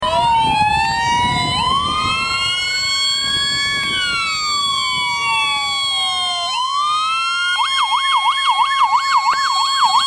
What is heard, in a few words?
siren